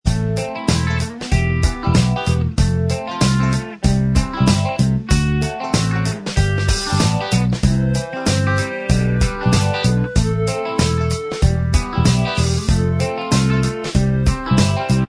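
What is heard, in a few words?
jpop instrumental, backing track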